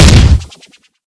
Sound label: heavy impact